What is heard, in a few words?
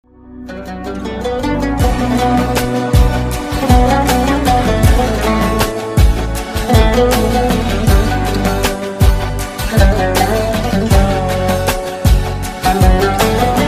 beautiful arabic melody ringtone